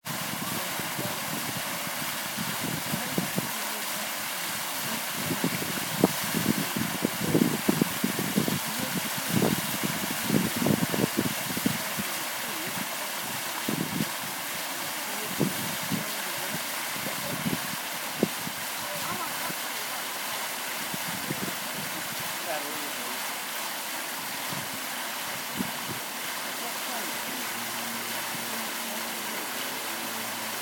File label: water tumbling voices wind plane